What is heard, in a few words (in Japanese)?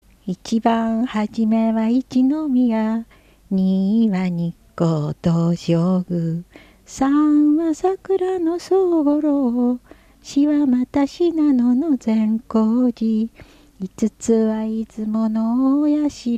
itibanhajime, minyou; 長生村; 一ばんはじめは